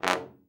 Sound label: trombone; Best Service; Killer Horns